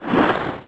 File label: SWING